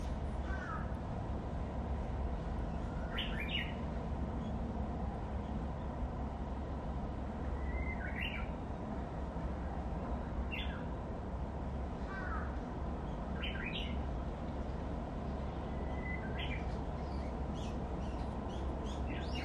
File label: uguisu